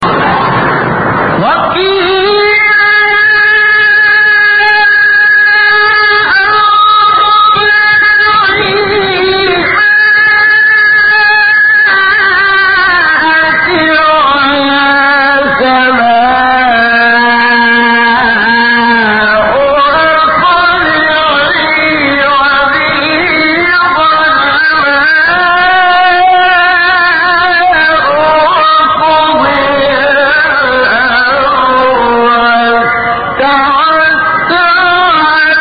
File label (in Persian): تلاوت زیبای آیه سوره; 44; هود; شحات محمد انور